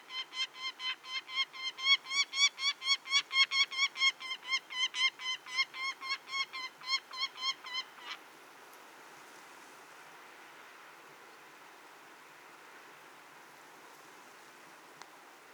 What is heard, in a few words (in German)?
Wanderfalke; Vogel